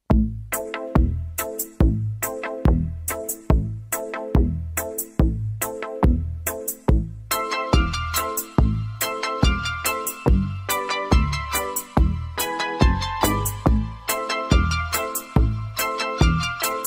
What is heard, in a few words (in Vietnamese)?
nhac xo so